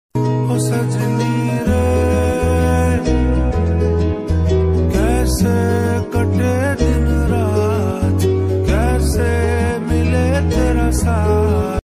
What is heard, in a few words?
emotional bgm ringtone; lapata ladies